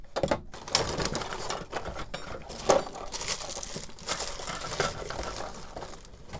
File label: Rummage, Toolbox Rummaging